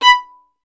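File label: STR violin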